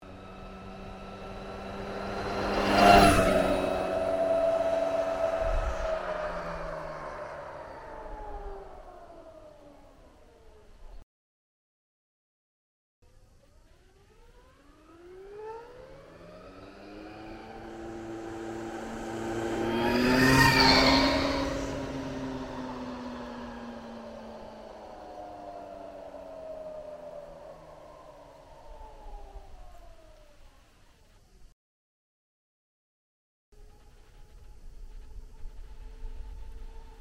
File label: slow speed sound; livewire